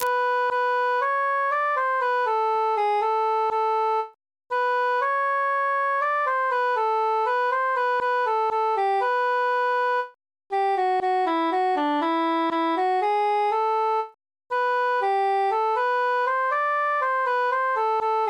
transposed tenor mute; la bionda trecca

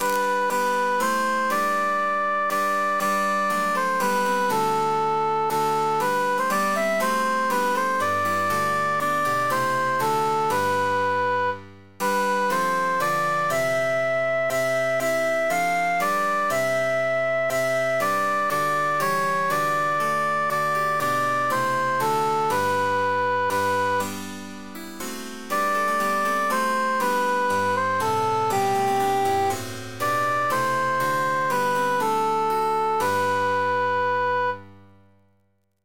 cantus emphasized; sing we and chant it

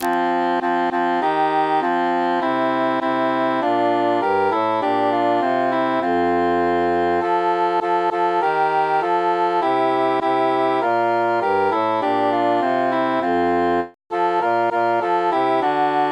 tenor mute; matona mia cara

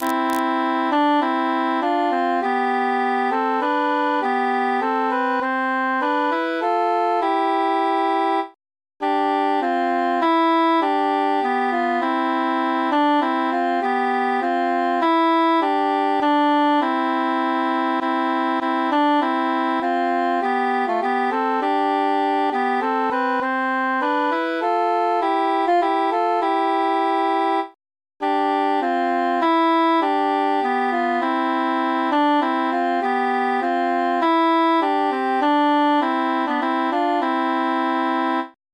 tenor mute; we be three poore mariners

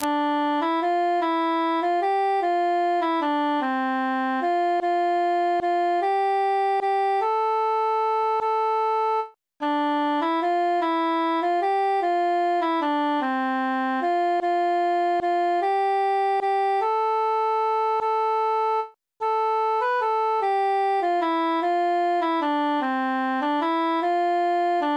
three country dances transposed tenor solo

